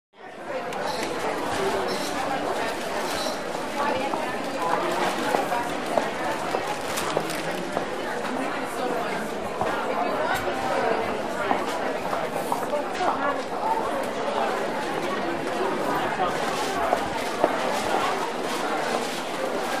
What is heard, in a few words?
Large Department Store Ambience, Mostly Female Walla Close To Distant. Some Close Salespeople But Primarily General Walla, Footsteps, Bag Movement. Medium Cash Register, A Few Phone